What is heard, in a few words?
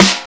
perfect acoustic snare sample g key; snare drum samples